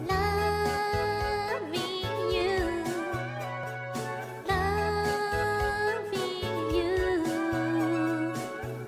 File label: Singing; IRyS